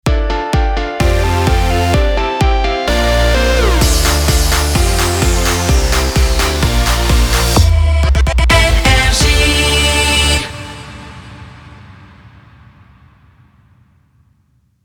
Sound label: Jingle Ramp; NRJ